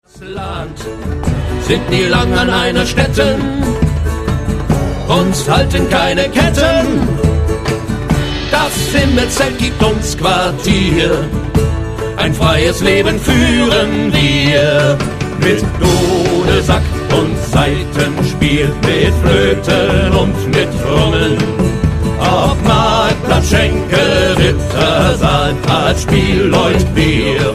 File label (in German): dudelsack und saitenspiel